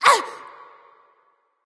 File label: grunt hurt; smite; nemesis